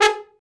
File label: TROMB; Trombone